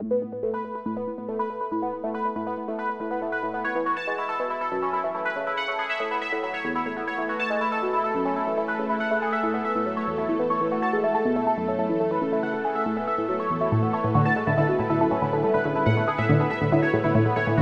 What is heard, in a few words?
Miniature, ringtones; KDE; Plasma-Mobile-0.1